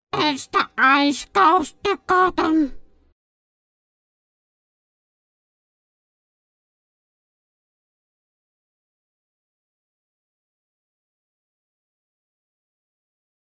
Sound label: kobolds outside cave